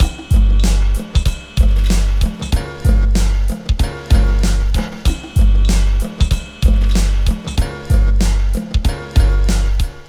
Beat 095, Hip Hop

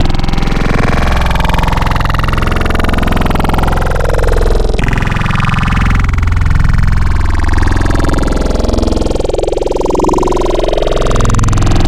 Growl Wavetables; Tenative; Cymatics; SERUM; Virus Wavetables

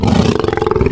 Puma; Cymatics; Serum; Animals for Serum - Gold Edition

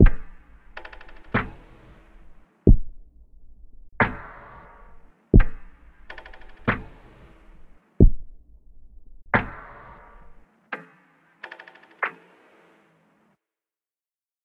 90 BPM B Min Drums; Cymatics; Destiny Gold Expansion Stems; Intermission

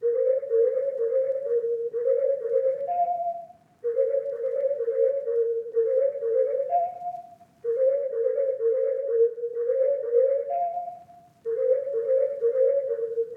126 BPM A# Min Whistle; Cymatics; Slime; Destiny Gold Expansion

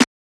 Snare Hit; Hip Hop Exotica; big fish audio; 086 Emin

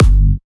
EDM Future Kick

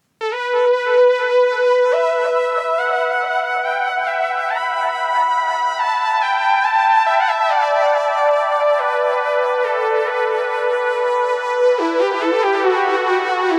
140 BPM B Min Lead; Cymatics; Destiny Gold Expansion Stems; Analog Nightmare

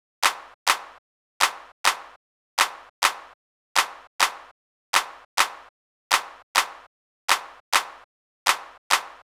Clap, 102 Emin; Hip Hop; Big Fish Audio; Hip Hop Exotica; Drum Tracks

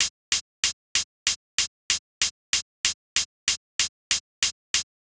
Hat, Drum Tracks; Hip Hop Exotica; Apple Loops; 095 Ebmin

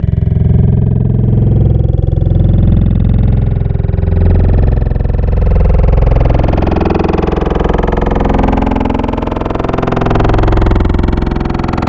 Gag Reflex; Wavetables; Cymatics; Vomit for Serum Vol 2

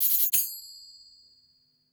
Percussion Hit; Hip Hop; 095 Ebmin; Hip Hop Exotica; Apple Loops; Big Fish Audio

